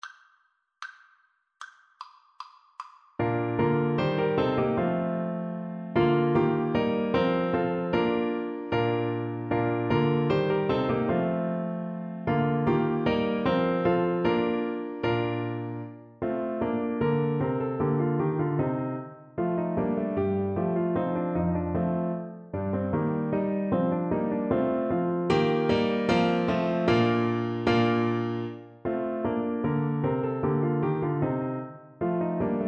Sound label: ding dong, Trombone